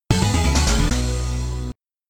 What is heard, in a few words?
Multiball End; Jack Bot; Williams pinball gamerip arcade